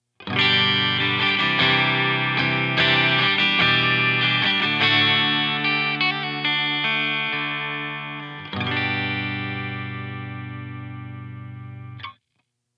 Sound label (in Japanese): パシフィカ Clean, yamaha pacifica 612